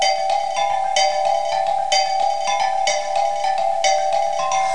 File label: jungle loop